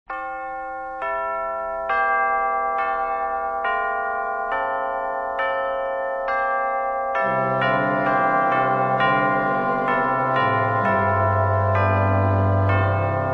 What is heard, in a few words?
Cathedral Organ And Chimes